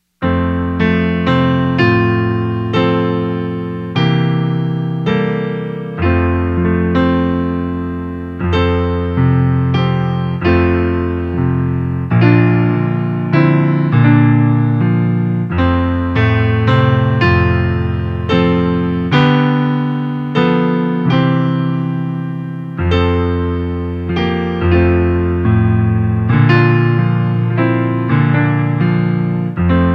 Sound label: instrumental